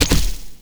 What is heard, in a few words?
pen crossbow